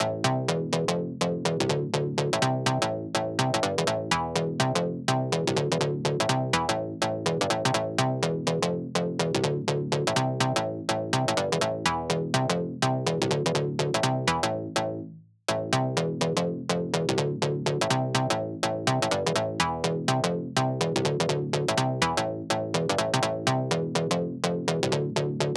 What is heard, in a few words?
Bass DRY NO SC, Future House 124 BPM A; Big EDM; Future House Tools; Construction Kits; Cracker